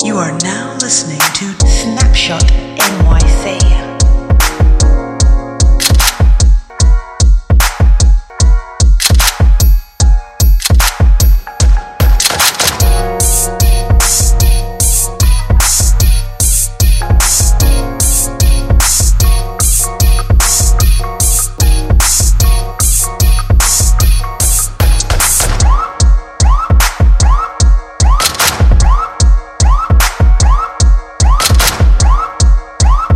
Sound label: jersey club type beat